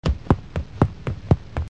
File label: Fast Foot Steps